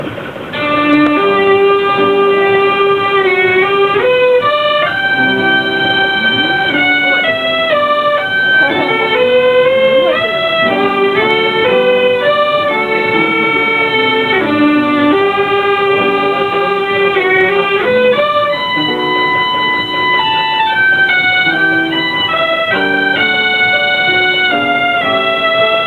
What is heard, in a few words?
orgel